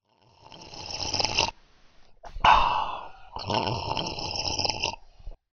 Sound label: Double Slurp